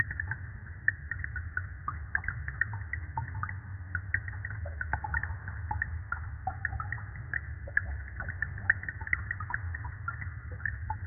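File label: cavern background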